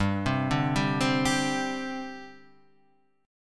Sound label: G Suspended 4th G down, Gsus4 chord; arpeggiated; JGuitar